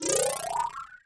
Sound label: power; Szkola Koziolka; CyberMycha 2003 October